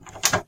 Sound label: key close